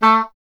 E. Horn

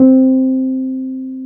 PNO T.Wurly C 4; Keyboards of The 60's and 70's

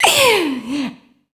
FEMALE, Dance Vocals

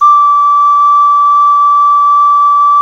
CLAYFLUTE, Heart of Africa

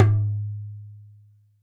udo bab f, hand drums; SoundBytes - Early Patches

